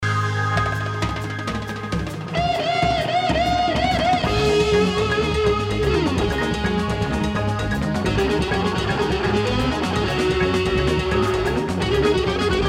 Guitar Solo

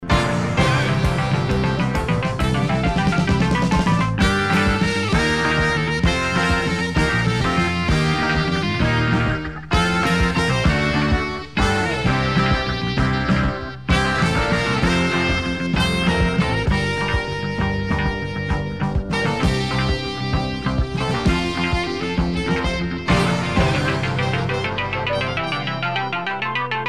Instrumental A with Sax

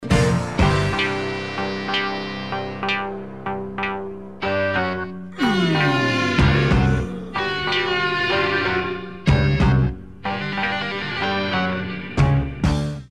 intro demonic

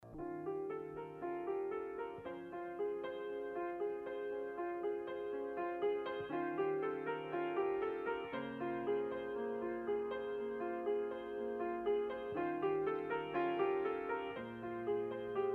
Piano Transformation

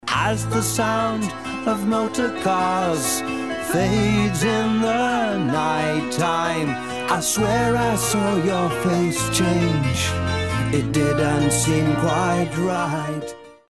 Modulation

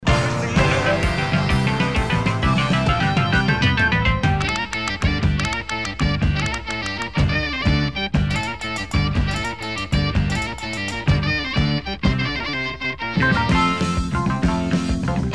instrumental development

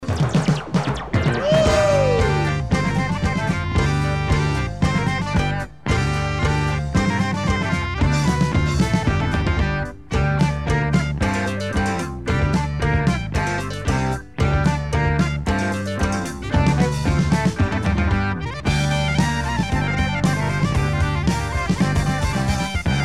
Instrumental

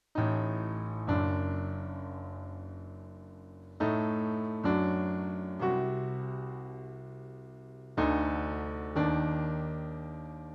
Piano

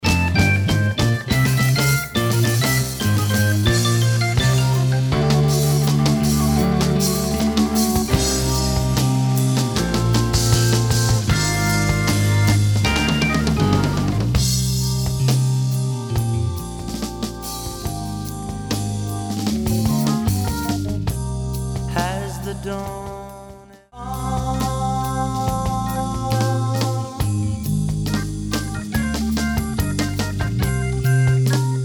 instr bass

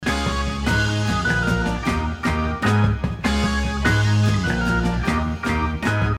organ motive

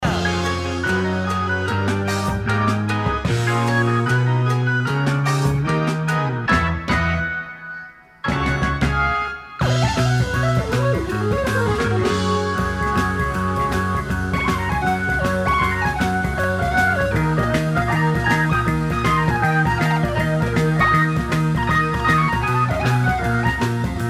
Instrumental

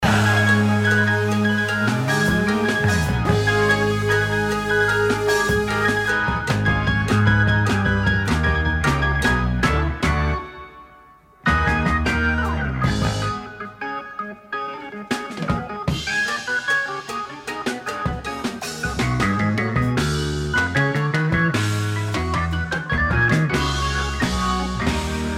Instrumental

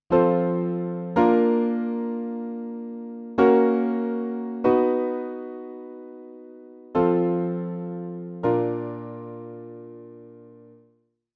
broken cadence

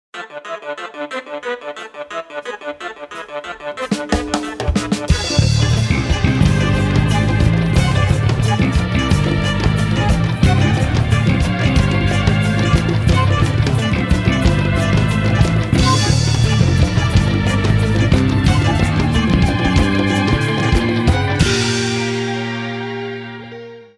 Chimbanguele; Hipo

